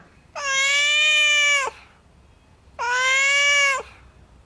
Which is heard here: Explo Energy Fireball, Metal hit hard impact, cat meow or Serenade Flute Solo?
cat meow